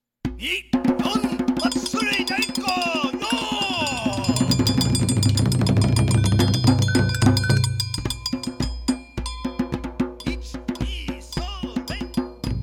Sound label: Ni Yon Matsuri Daiko